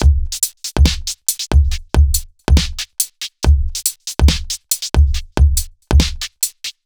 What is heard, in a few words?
Blop Break, Bpm 140, Dubstep, Drum Loops